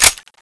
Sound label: mp5 slide back; mp5navy; weapons